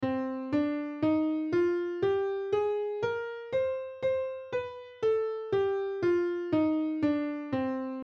melodic minor full

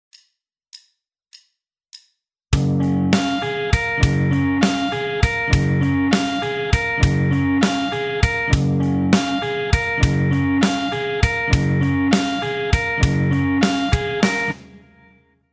eight string guitar

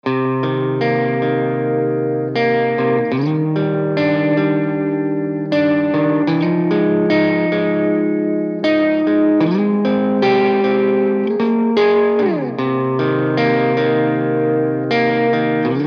guitar plugins

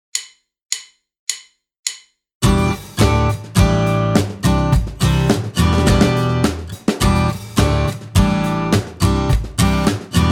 liven up chords

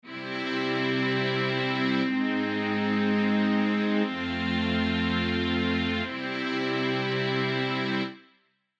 common chords

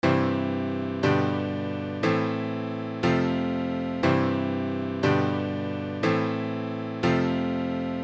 c mag iv vi iv progression

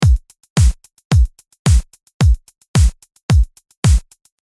High Snare and hi hat tracks

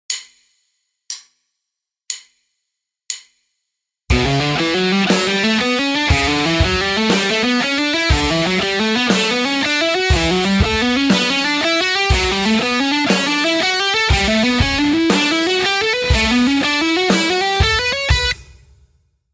legato slow

